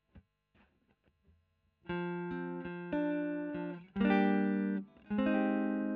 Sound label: E. GTR Royer